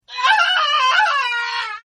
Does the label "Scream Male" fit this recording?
Yes